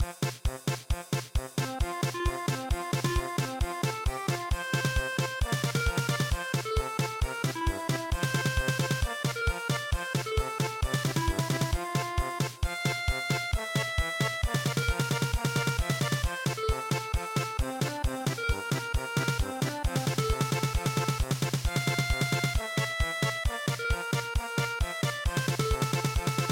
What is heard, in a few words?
German Polka